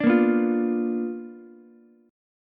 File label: B Minor Major 7th Flat 5th Ukulele; strummed; JGuitar